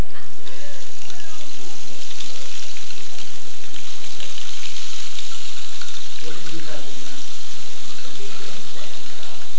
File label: rainstick